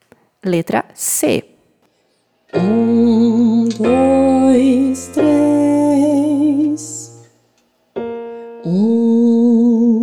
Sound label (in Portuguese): Solfejo Melodia Solfejo Melodico